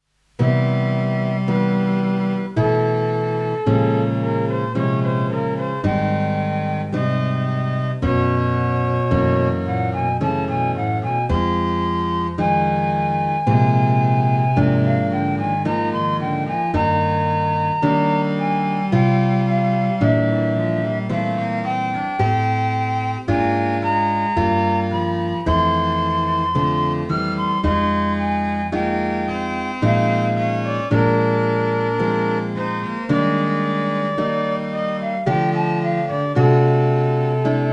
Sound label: Trio